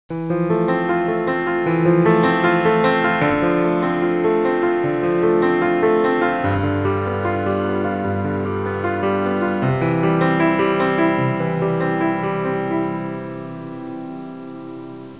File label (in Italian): suonerie classica; musica classical